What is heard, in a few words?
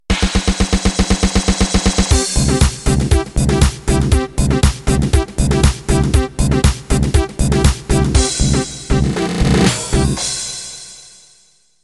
House Intro